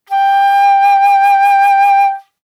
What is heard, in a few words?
Flute vib ff G5 stereo; Woodwinds